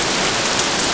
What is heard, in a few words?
RAIN; AKAI S-Series CD-ROM Sound Library VOL-8; SFX